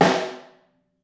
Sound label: SN2 Room, snare; Ableton Foundation FreePacks; Snare Designer